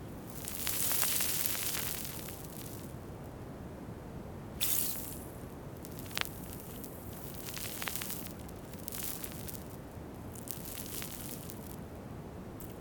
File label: Sand Hydrophone